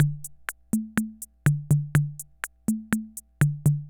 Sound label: Ace Tone Rhythm Fever Loop; Drum Machines; 300 Drum Machines